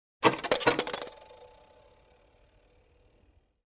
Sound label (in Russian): phone